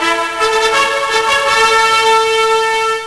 Start game; Outland Games

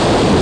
Static; Twilight Staque; HyperCard

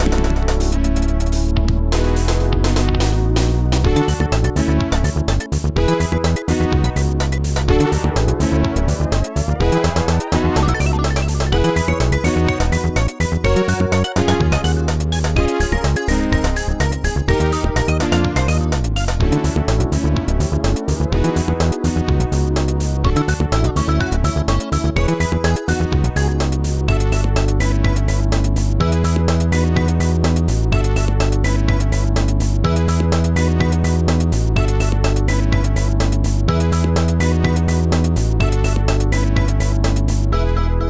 tune; music

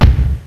bassdrum; Sounds Terrific II; Weird Science

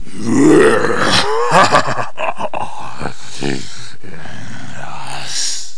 argh; 7invasores

